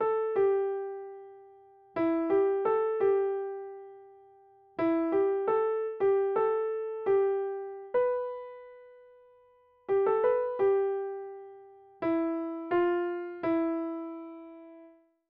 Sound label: simple piano melody; Songwriting challenge